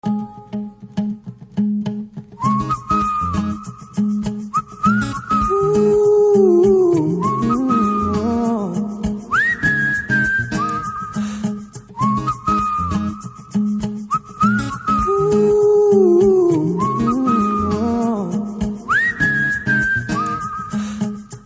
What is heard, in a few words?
Whistle Tone; Neevevaro; Telugu